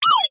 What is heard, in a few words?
Minimize Sound